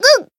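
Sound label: damaged, Japanese; leviachan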